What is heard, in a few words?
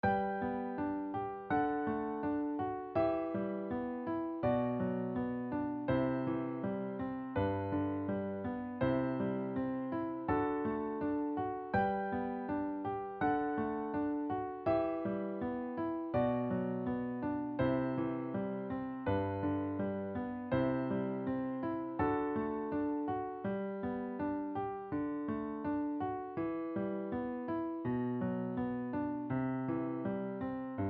turn off your phone soprano